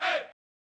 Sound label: Chants, Vox